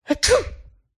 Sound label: human FEMALE sneeze